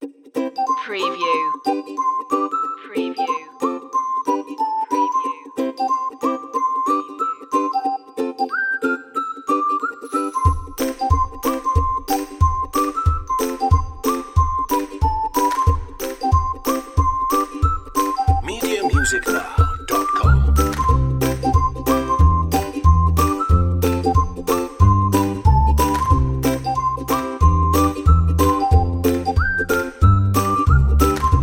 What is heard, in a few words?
reggae happy whistling version